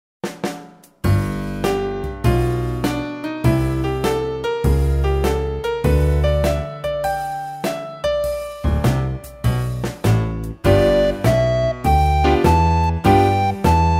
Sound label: Blues Practice